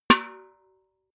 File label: metal bucket hit ring soft